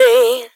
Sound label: Synth Voc; Synth Shots; Vengeance Samples